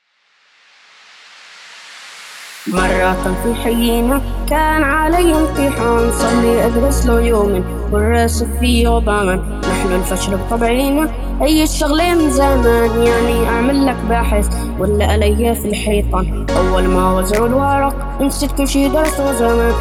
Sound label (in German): Der Party Hit